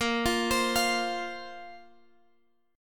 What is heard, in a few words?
Bb Suspended 2nd, Guitar, Drop D; arpeggiated; 20,20,22,22,x,x